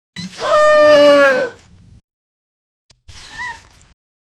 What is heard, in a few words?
rough muscle growth sound, body horror